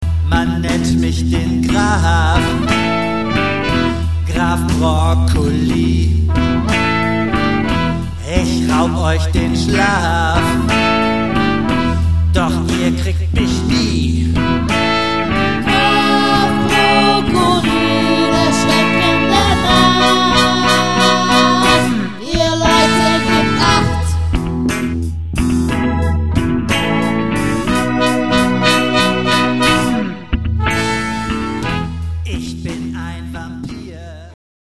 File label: Blues